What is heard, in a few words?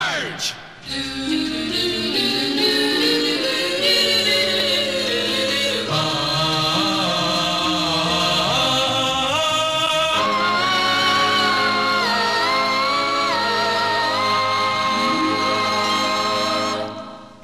Sweep, Radio style ads intros sweeps & jingles